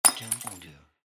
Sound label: Breaking Glass